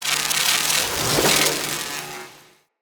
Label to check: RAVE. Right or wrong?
Wrong